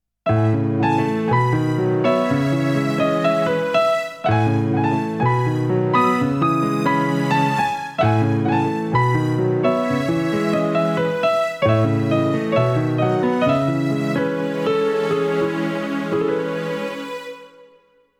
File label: jingle